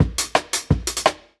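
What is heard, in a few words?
Jungle Breaks, 170 bpm; Certin; Original Jungle Breaks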